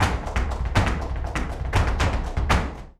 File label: Flamenco, Tapping, Bulerias, 121